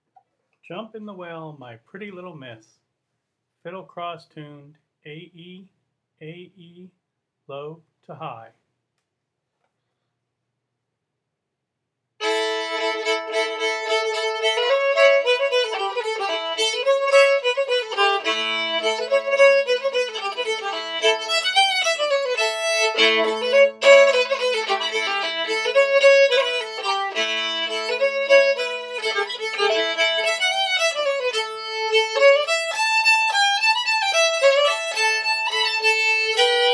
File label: fiddle; festival tunes